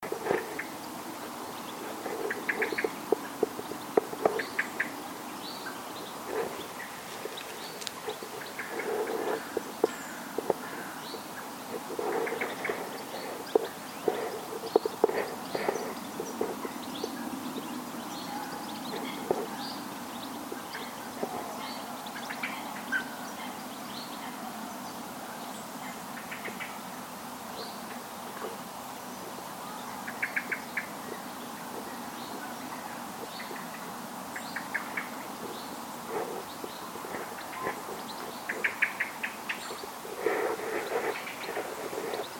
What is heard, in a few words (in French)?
chardonnerets; automne et hiver